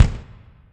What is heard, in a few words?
Kick Drum